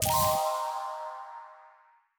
mobile game special zipper bell power up

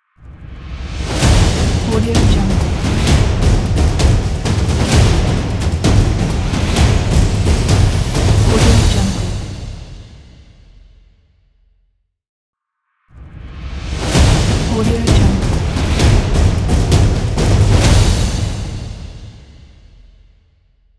drums power logo

